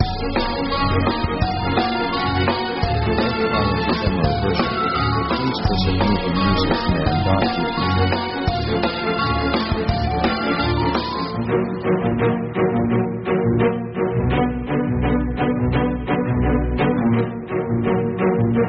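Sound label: orchestral strange fast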